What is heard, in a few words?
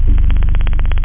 soft, drums, bass